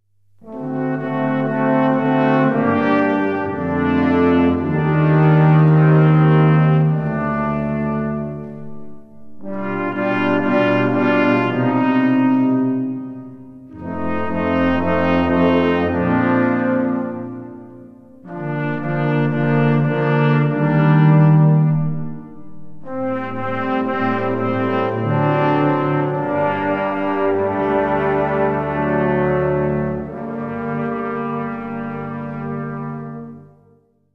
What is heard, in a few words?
sinfonia con trombe nr 1 grave